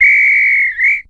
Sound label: Referee Whistle, Sports & Recreation